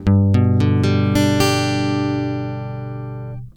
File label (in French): accord sol majeur lent, l’accord de Sol Majeur en vitesse ralentie; guitare